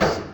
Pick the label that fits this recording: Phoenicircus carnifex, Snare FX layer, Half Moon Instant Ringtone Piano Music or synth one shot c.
Snare FX layer